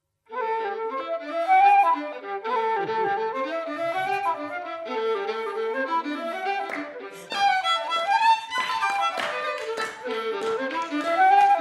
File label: squaredance